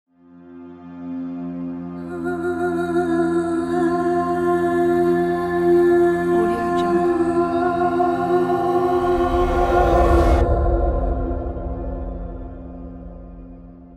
female vocals logo

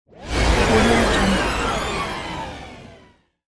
time reversal

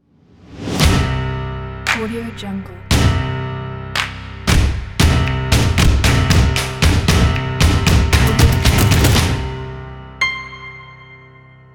cinematic action logo

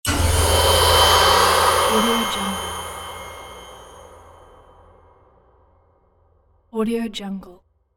ghostly horror transition; applehillstudios

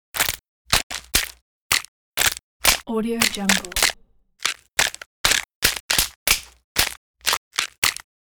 wood snap bullet impact

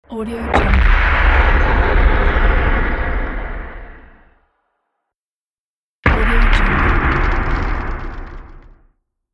explosion

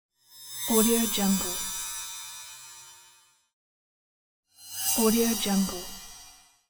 magic whoosh sound

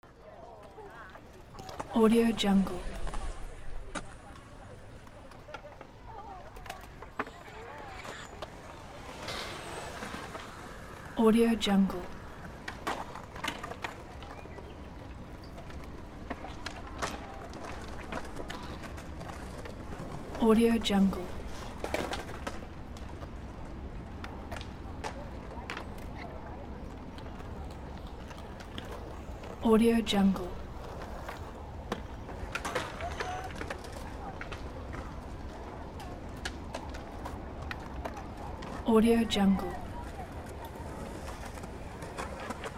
skatepark ambience

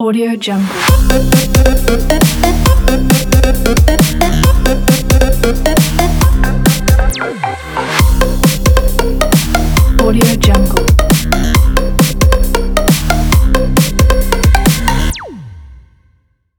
fashion breaks logo

